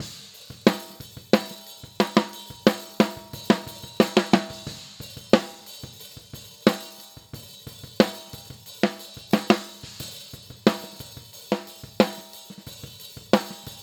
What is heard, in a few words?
Snare; Pro Tools Essential Demos